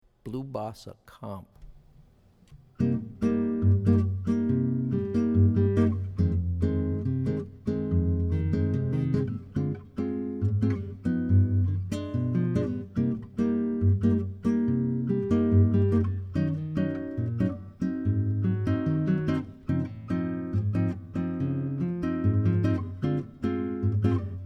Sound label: bossa comp